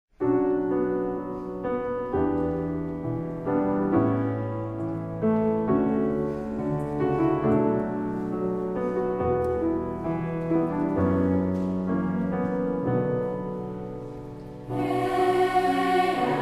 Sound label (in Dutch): hebreeuwse psalm psalm 42